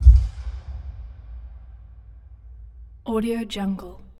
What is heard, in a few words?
sonic boom kick drum hit deep impact; prosoundfx